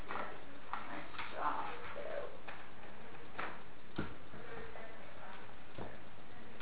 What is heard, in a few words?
stairs setting up camera; wornall house